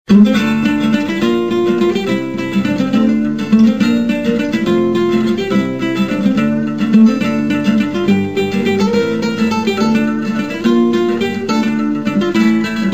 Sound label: Pirate Guitar